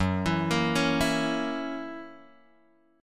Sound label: F sharp 7th, guitar; F#7 Chord {2 X 2 3 2 0} arpeggiated